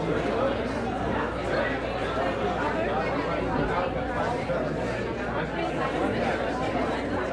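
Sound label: Boxing Crowd Small Ambience Loop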